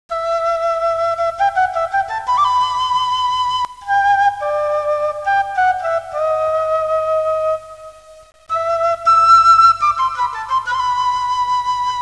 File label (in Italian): musica strumentale